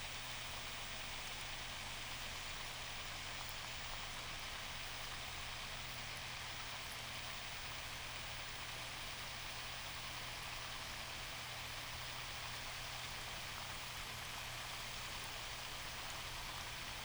squeak, hydrophones

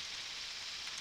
Background Noise

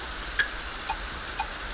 click, hydrophones

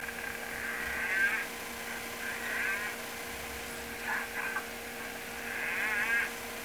Harbor porpoise

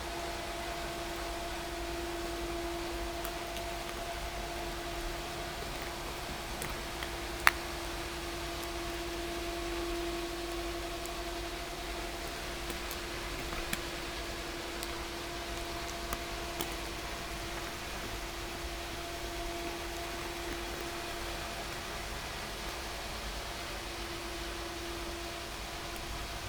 No Ship, helicopter

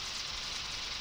Background Noise

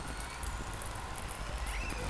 clicks

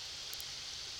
Background Noise

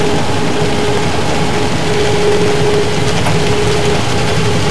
Unknown, SeaSounds

